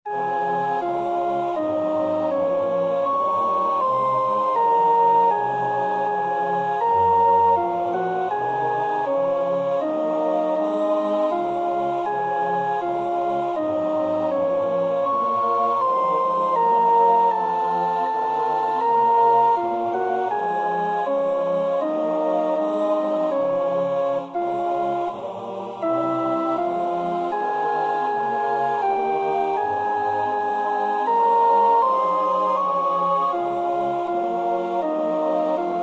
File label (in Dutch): sopraan, koorpartij oefening; Somervell (Arthur); The Passion of Christ